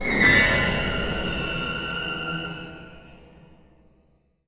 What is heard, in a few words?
Accent Beat ~ Tense Hit; Cabela's Dangerous Hunts 2013; PS3; Wii; Xbox 360